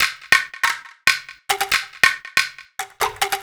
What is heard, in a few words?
Addon Loop, Drum Loops 140bpm, Perc Loops